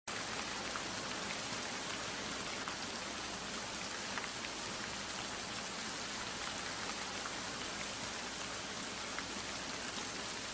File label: Rain; Soundscapes